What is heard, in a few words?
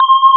INHARM C5; E-MU Formula 4000 Series Vol. 3; Analog Odyssey; Synth Builder